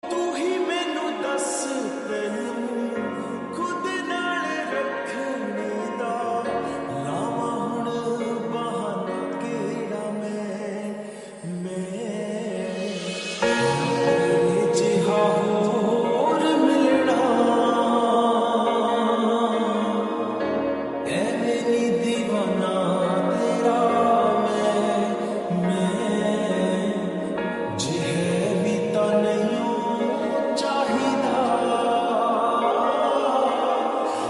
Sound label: lofi punjabi song